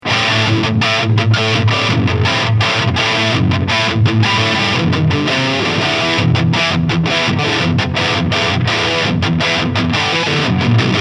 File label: Cort KX508MS II Lead; Marina Blue Burst